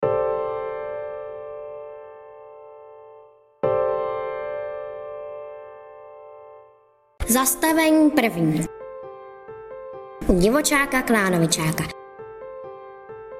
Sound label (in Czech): bas hudba